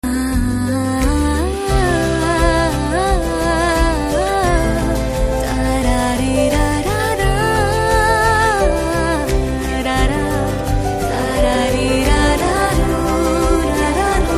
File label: Humming